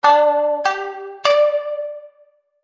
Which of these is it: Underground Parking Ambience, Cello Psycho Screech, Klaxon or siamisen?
siamisen